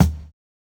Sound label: Kick, jungle breaks drum sounds